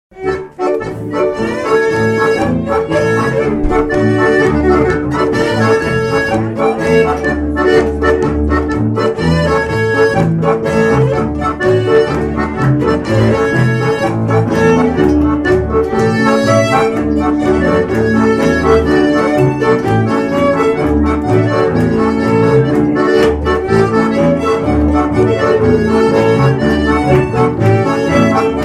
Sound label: Buehlerschottisch; Giersberg Sep2010